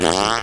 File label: fart short